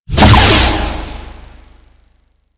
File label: Buff Burst; Warframe; Syndicates; Arbiters Of Hexis